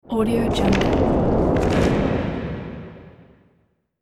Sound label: spellbook impact